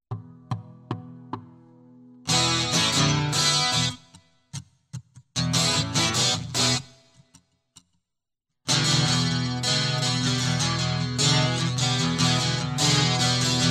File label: Guitar